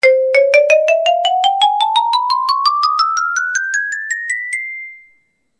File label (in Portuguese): arpejo marimba